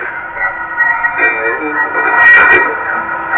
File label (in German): du bist verrueckt mein kind gesungen